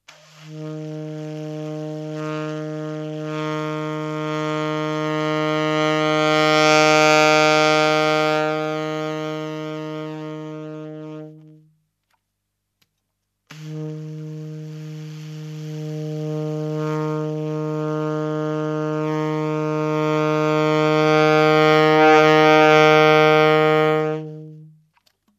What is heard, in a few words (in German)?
Alt Sax Tiefes Es P F P